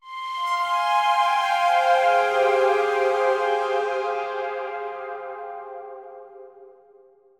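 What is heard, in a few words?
Synth F minor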